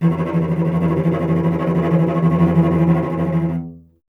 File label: STR VC E 1, Cello; Miroslav Vitous; Solo Instruments VOL-1